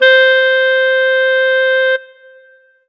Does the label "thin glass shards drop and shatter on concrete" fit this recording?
No